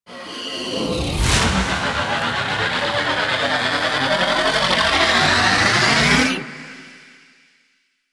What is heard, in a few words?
Cinematic Laser Build; Warframe; Ambience; Hub; Tenno Junction Relay